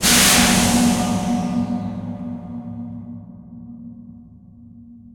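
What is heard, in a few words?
Air release reverb; Puddle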